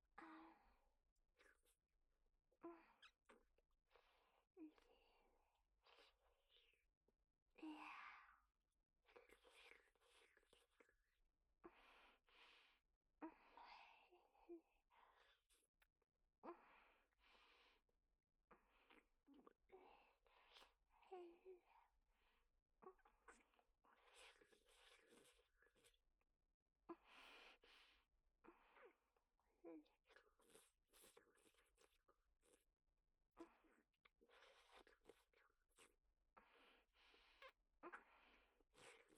フェラチオ, ASMR; アドリブ集

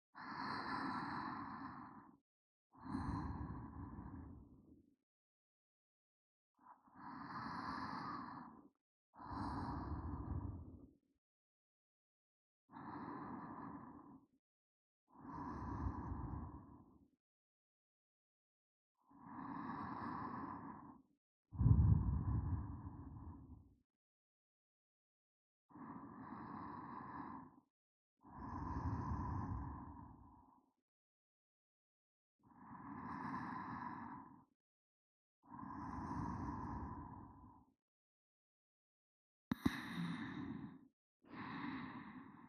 寝息

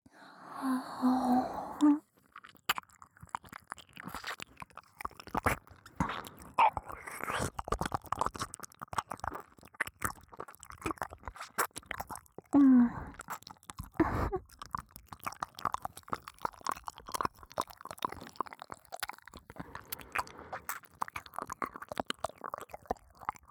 左耳舐め, ASMR

